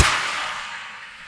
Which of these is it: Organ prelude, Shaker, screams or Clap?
Clap